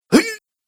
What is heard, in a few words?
Karate Male Strength, recorded voice